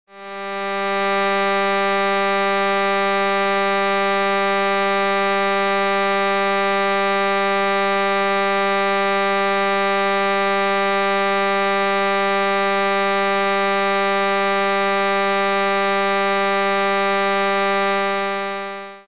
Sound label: violin G 4th